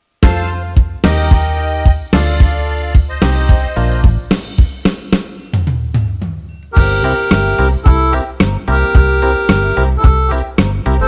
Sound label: Disco